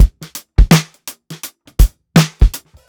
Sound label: SWUNG 83 BEAT, DRUMS LOOPS; BASEMENT DRUMS VOL 2